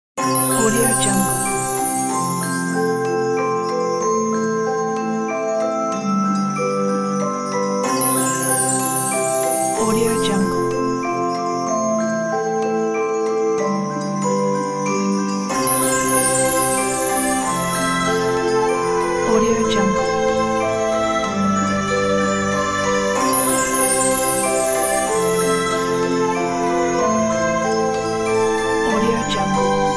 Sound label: christmas ident